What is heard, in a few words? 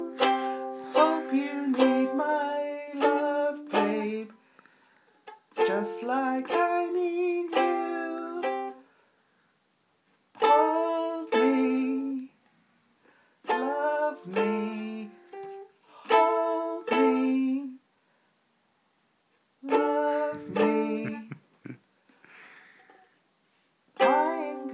uke